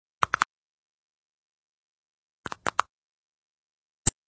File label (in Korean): Fights, 싸움-전투; 헐리웃특수효과음